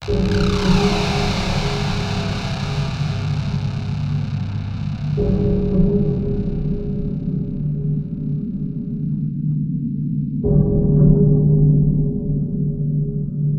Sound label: Death; Unreal II The Awakening